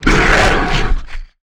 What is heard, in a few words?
gorilla Win Roar; netent